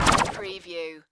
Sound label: Magical Descend Sweeper Fast